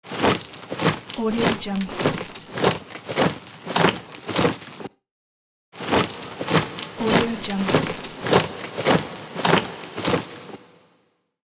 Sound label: steps of knights